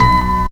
Bass n Piano; Zero-G Jungle Warfare 1, 2 & 3

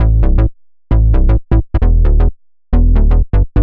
Bass 132 A, uk garage

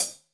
HH, Drums; Bob Clearmountain Drums I

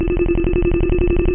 Sci-Fi, Classic SFX, cartoon; musicradar; cartoon caper

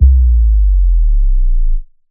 Sub Kicks, Bassdrums

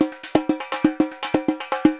Repique Samba 120 bpm; Best Service; Festa Latina VOL-1

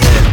BOOM, Hip Hop; E-MU Formula 4000 Series Vol. 1 - Hip Hop Nation; Wicked Hits

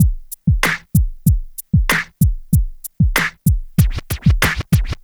Smooth, R&B Drums

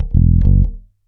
DOPE BASS; E-MU Formula 4000 Series Vol. 1; Hip Hop Nation

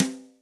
Yamaha DTXpress, Electric Snare; drum machine; Brsh Op

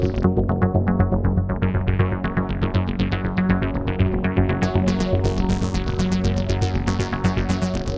Arp, Keyboards

